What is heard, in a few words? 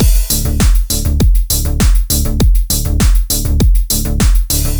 loop